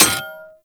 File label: Melee Axe Impact Generic; Warframe; Axes